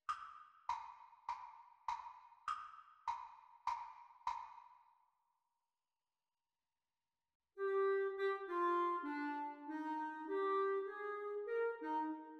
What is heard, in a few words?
Practice Recording Clarinet Duets; Grade 4